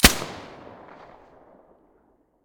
silencer fn2000; stereo

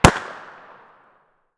fiveseven muzzle mono far, weapons

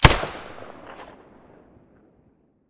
silencer, weapons; vpo101

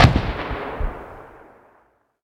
grenade explosion far

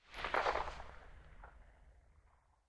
scar silencer mono very far

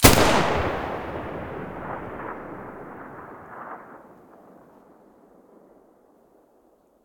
muzzle g36 stereo, weapons

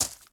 bolt gravel, material; STALKER; IWP

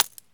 Shell 39mm dirt; STALKER; IWP

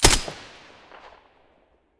m4a1 silencer mono, weapons

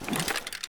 sprint end pkm; STALKER; IWP

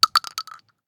shell 12ga default; STALKER; IWP

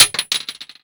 Shell 9mm metal; material; STALKER; IWP

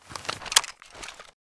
gauss on; weapons

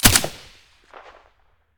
scar silencer stereo

